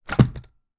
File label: household vacuum cleaner bosch pro animal hose drop on carpet